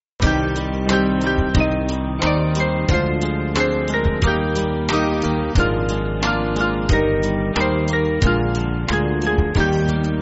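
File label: Band, Backing Files for Churches; Hide Not You Face; Kareleu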